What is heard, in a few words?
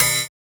Cymbal, JUNGLE BREAKS DRUM SOUNDS